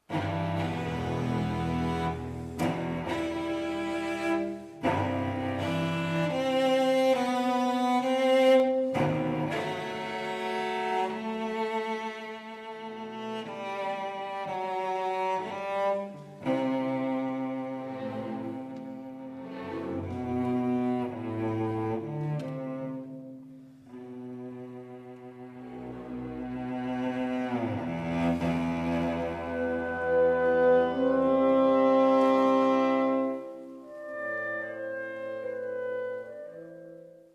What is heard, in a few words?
Elgar cello Concerto 1st Mvt